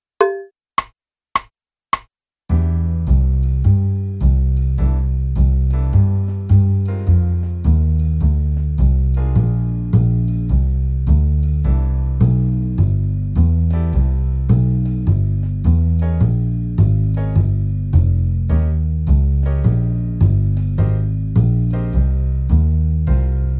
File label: Jazz Guitar Figurations